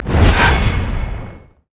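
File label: armadillo defensive ball curl; rammus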